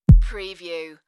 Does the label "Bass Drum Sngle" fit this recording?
Yes